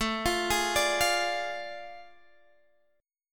A Major 7th Suspended 4th Sharp 5th, Guitar Drop D; chord